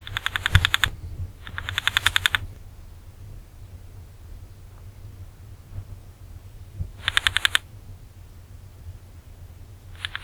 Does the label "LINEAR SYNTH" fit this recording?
No